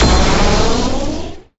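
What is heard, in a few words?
Card Level up; Zenonzard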